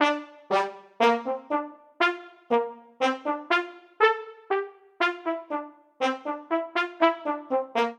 Ostinato Sparse Line iv-VI-i-VII D Minor 120 bpm, Brass Quartet; MIDI Clips; Ableton; Spitfire Audio